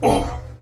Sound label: human male hurt, utterance